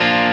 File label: 0 2 2 2 0 A